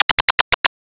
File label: spawn sound effect, ui sounds